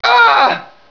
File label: pain; payned